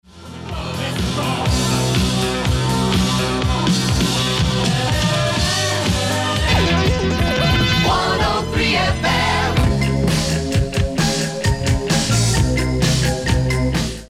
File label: jingle; fm103 whtt